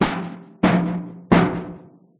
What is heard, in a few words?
Vent Bang; Five Nights at Ronald's